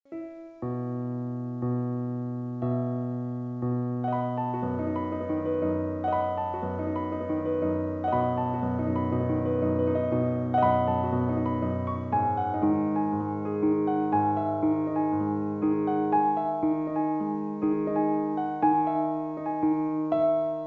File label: Piano